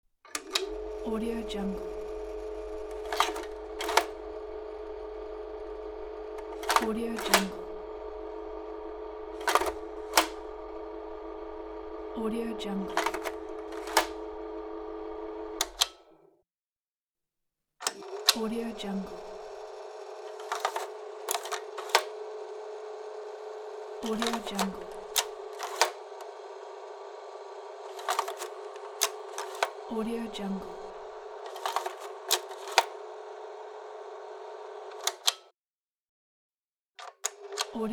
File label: slide projector operating